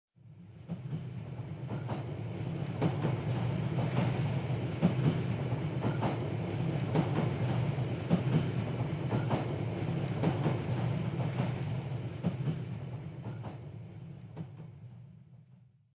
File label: train